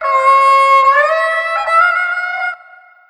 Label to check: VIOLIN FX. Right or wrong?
Right